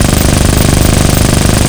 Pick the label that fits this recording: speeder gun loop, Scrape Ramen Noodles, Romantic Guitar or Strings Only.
speeder gun loop